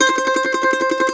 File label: MAND TRM, Mandolin